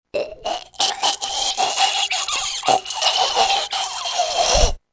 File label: Choke